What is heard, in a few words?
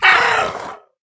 shadow spawn death